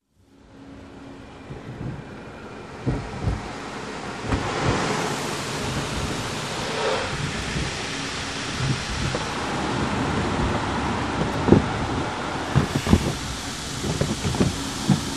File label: Driving through Car Wash